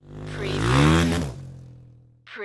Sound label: Motorcycle Dirt Bike Driving Off Jump Left To Right